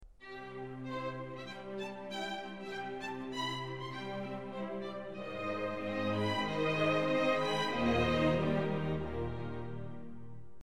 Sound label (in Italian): violini; pierino lupo